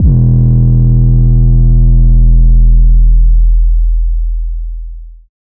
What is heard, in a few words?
808 E; Cymatics